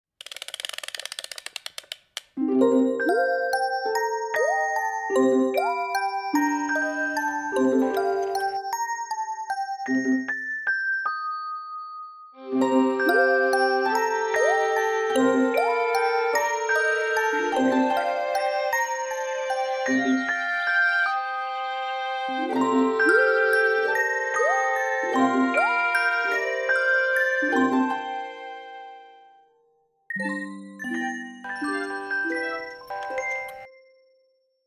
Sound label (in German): Spieldose